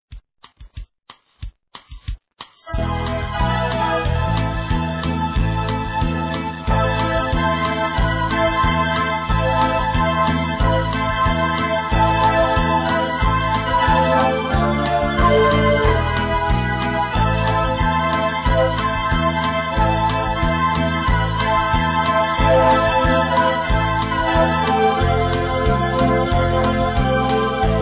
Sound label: harmonica